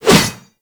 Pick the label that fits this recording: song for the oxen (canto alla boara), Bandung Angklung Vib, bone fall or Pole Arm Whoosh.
Pole Arm Whoosh